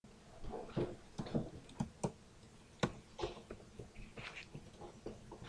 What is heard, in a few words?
fire